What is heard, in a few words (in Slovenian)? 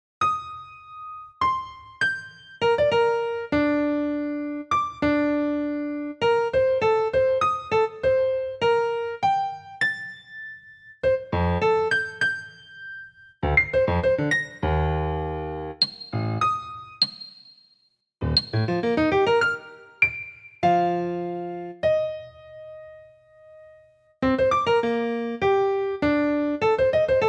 KLAVIR